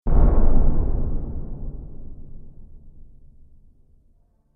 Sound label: Drum Hit Trailer; sound design